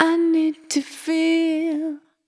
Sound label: I Need to Feel, Vocal Samples, Single Voice Melodic Loops; Singles